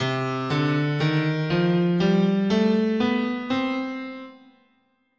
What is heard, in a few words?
C Melodic Minor, scale